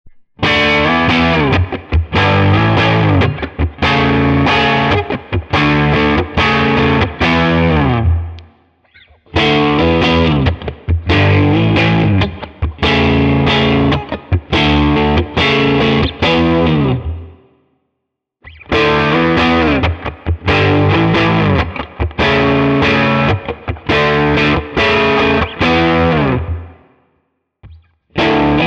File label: Mid Gain Riff All Positions